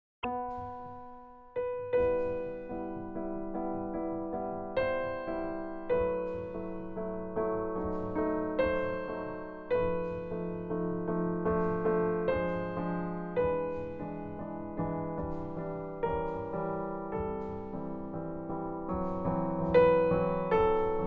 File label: chopin prelude em bechstein, piano